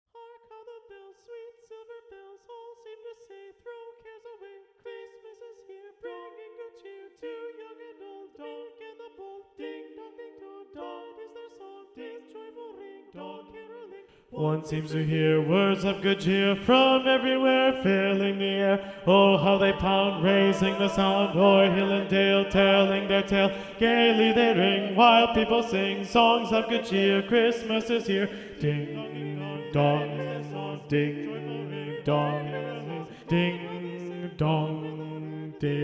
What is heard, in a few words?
Carol of the Bells SATB, Bass Predominant